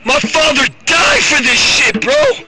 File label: alert; slender; shia labeouf